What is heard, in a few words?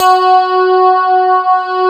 CLAV, FUNK FM CLAV; East West; Twisted Textures-BT